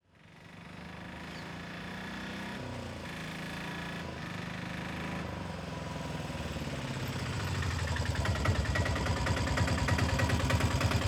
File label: Auto Tuk Tuk Diesel Auto Rickshaw Pull up Medium speed Idle Shut off; Tuk Tuks; The AudioVille